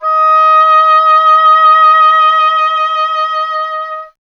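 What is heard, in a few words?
oboe, wind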